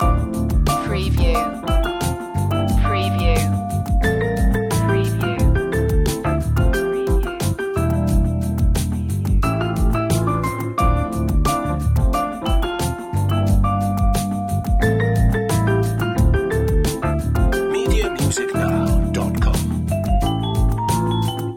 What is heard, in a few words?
gravel groove loop